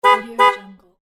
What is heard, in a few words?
car horn double quick